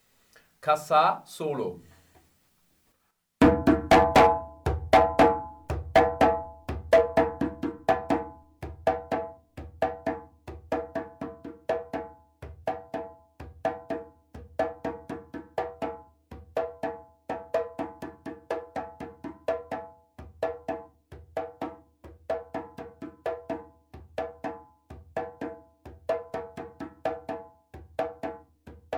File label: kassa solo, djembe; Kapitel 3 - Solis; djembe aufbaukurs 1